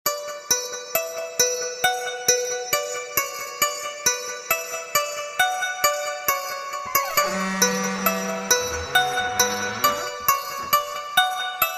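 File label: Horror Beat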